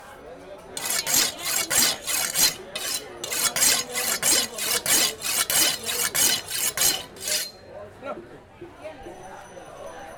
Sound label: Sound Of Someone Sharping His Metal Knife On A Grindstone